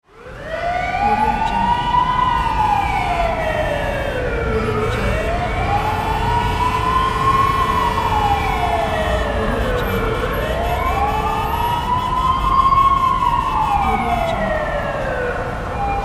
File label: traffic jam